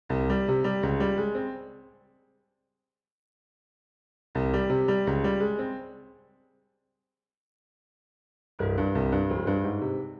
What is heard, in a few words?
miniature in jazz, piano; pieces pour piano